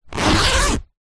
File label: tent zipper door compartment; foley; zapsplat